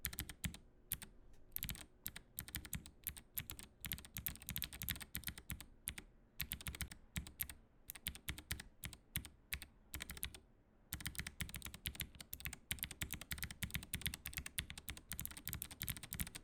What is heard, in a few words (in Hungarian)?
Melodic, kapcsolok; avax gateron kapcsolok